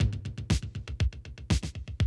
Classic Drum Loops

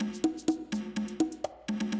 125, Classic Drum Loops

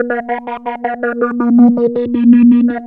3100 DP A#4, Korg PS3100; Back In Time Records; Korg Universe VOL-1

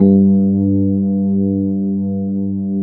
Fender G1; USB Soundscan vol.09; AKAI; Keyboards Old School

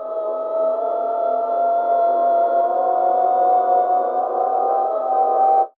WEIRD VOX; AMG - Vince Clarke Lucky Bastard

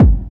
BD, BD Dance; Sound & Vision; Gigapack I CD 1

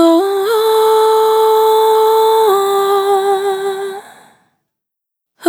Single Voice Melodic Loops, Vocal, Dry

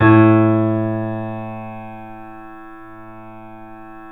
A, Piano; InVision Interactive - The Piano

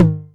Tom Hi, Novation Drumstation

